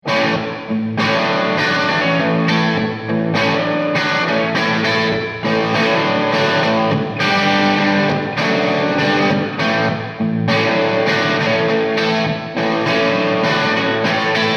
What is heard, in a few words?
Grand Hall